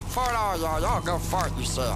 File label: fart; meatwad